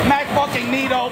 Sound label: sound effects